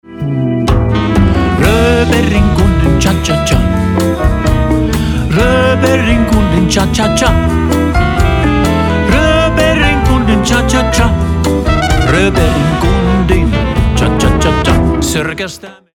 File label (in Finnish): cha cha cha